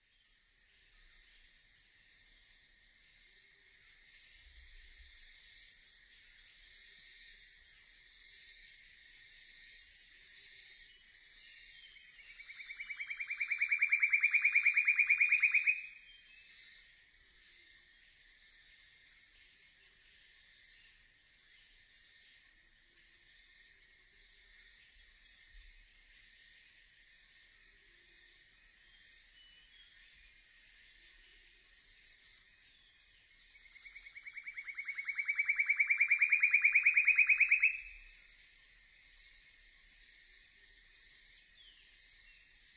canto da foice de bico preto

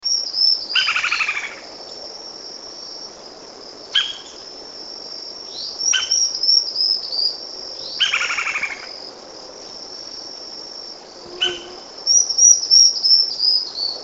canto do pica pau de banda branca

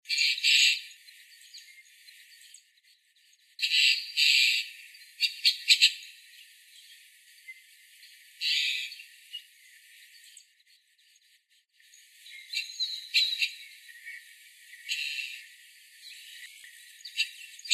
Canto do estorninho malhado

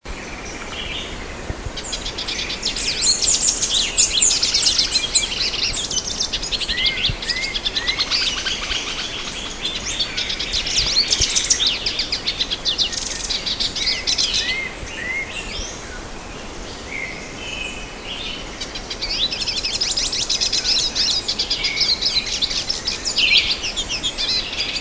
canto do pintassilgo do nordeste

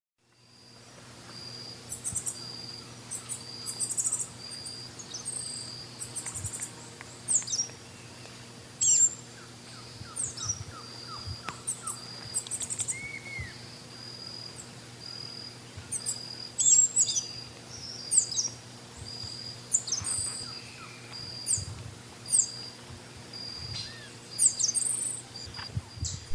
Canto do sai de barriga branca

